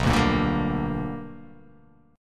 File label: B Minor Major 7th Sharp 5th, chord